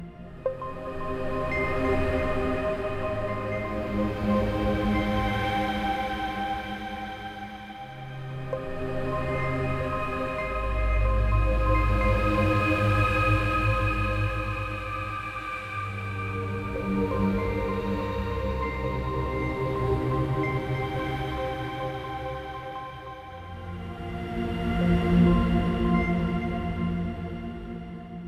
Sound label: Death Music